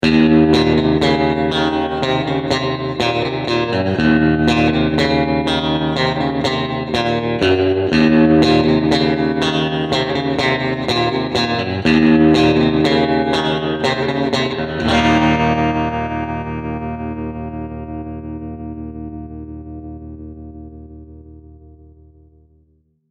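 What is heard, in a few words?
Reverb + Tremolo Vibroverb; fender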